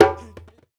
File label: African Perc